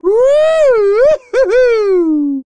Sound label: Man Woohoo; voice; Zomboid; Lifestyle